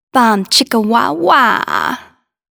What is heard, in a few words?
bom chicka wah wah